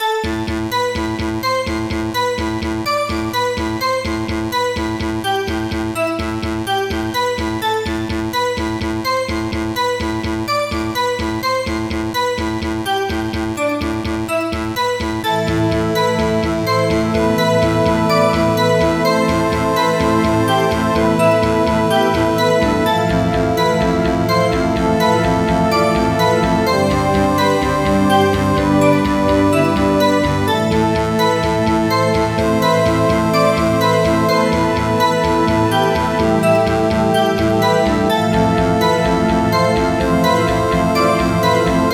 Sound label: questioning (moderato)